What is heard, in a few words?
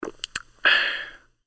beer can